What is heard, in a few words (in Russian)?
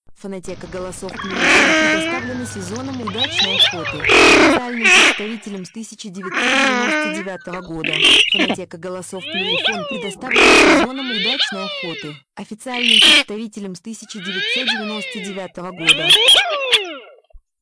нырок красноголовый; plurifon